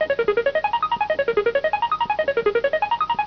board fill; wipeout

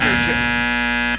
buzzer

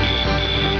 wrong

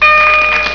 ding

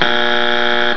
strike 3

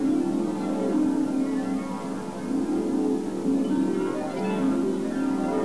harp

